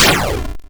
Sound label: shoot; HighNoonRoulette; Exobyt